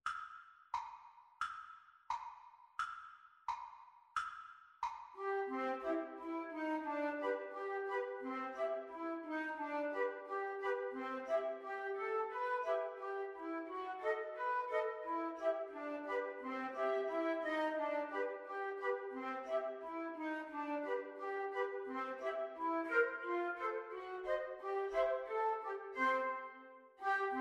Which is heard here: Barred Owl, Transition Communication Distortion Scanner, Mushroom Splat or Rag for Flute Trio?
Rag for Flute Trio